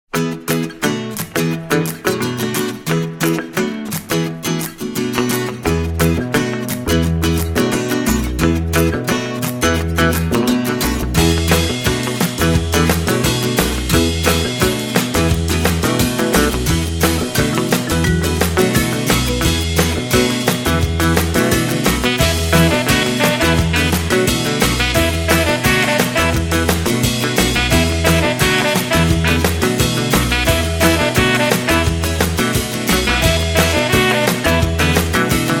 Party Band; Miami Party Band

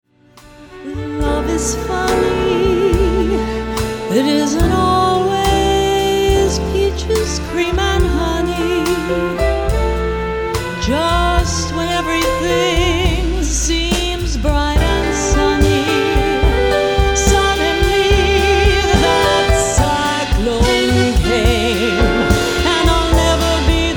Jazz Singer; Los Angeles Jazz Singer; jazz vocalist; sensuous jazz-noir sound

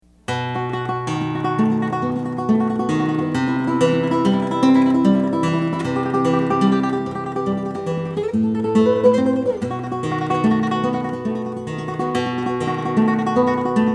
Flamenco Guitarist, falseta; Montreal

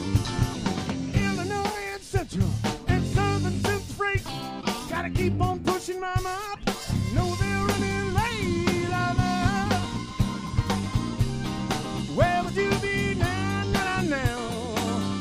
Cover Band; Orlando

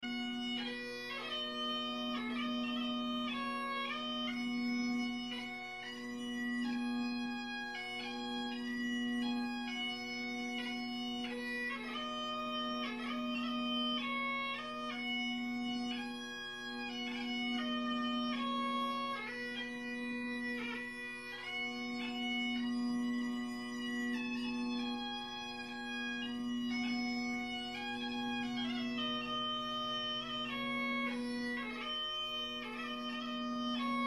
Bagpiper; Savannah

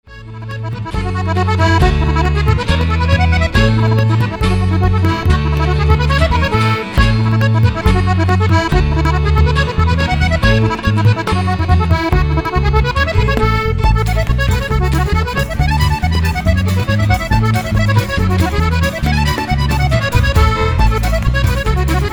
Irish Band, Reels; Milwaukee Irish Band

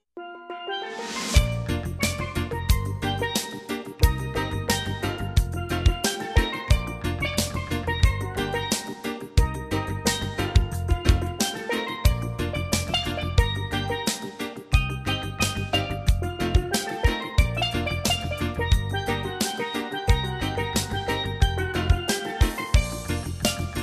Caribbean Band; Chicago

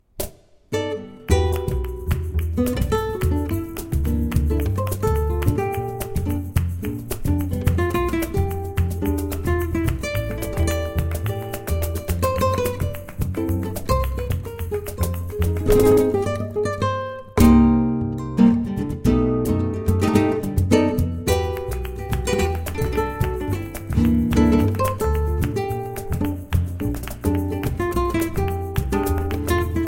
Flamenco Guitarist; Portland

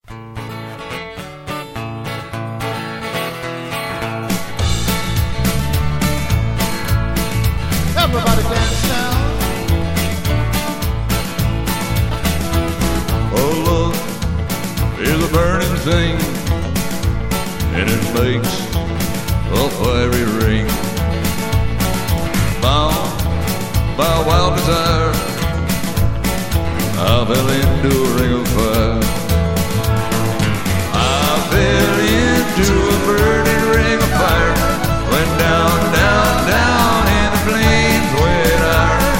Country Singer

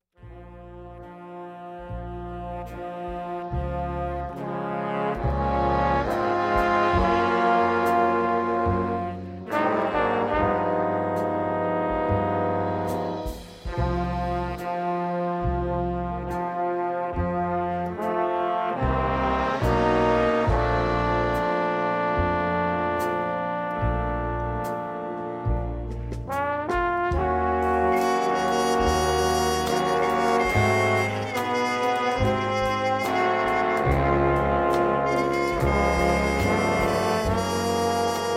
Big Band; Washington DC

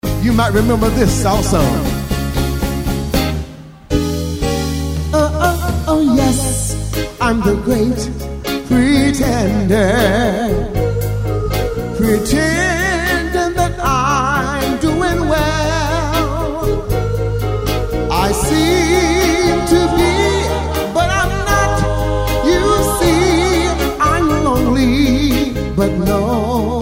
Wedding Band; Washington DC Wedding Band

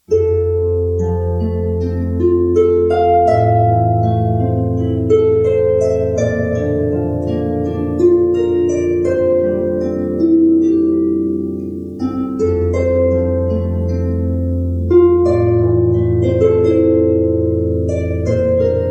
Harpist; Omaha

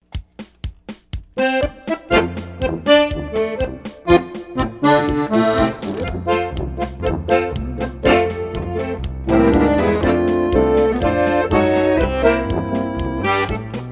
Polka Band; Denver

